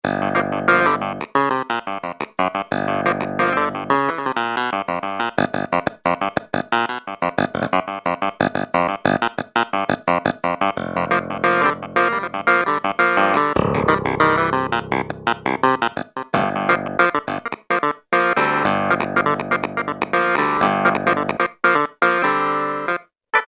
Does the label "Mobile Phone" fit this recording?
No